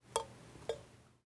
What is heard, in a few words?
water drip in empty pan metal pan high drips